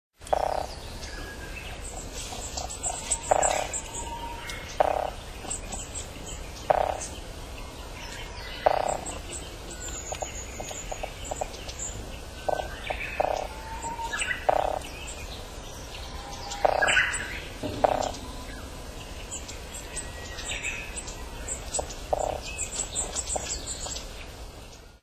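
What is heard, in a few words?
drumming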